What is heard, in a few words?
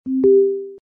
connect